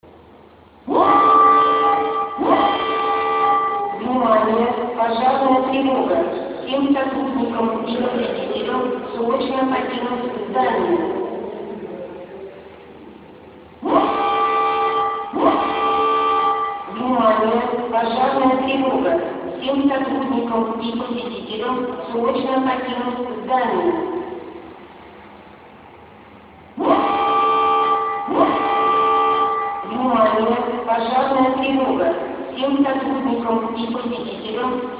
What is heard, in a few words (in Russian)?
Pozharnaya sirena, Pozharnaya trevoga